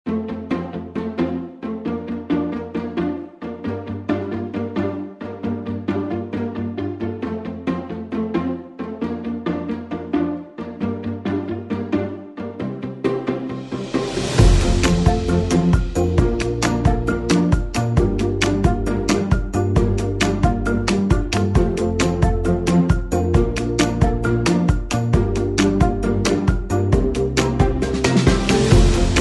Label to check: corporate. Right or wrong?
Right